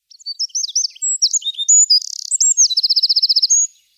Troglodyte mignon chant

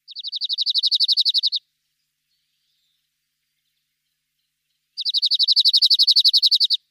Bruant zizi chant

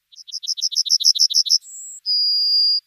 Bruant jaune chant

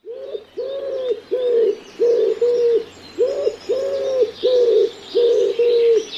Pigeon ramier

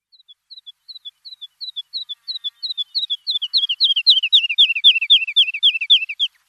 Alouette lulu chant

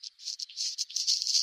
Rougequeue noir sequence

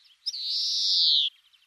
Verdier d Europe sequence

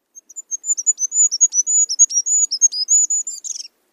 Roitelet huppe chant

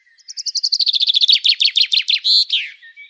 Pinson des arbres chant

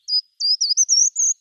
Grimpereau des jardins chant

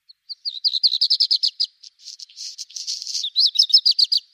Rougequeue noir chant

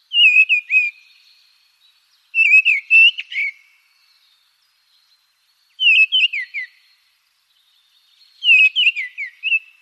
Grive draine chant

